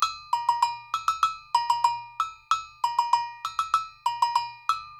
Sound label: NYC Percussionworks; Sampleheads; GJ LOOPS 96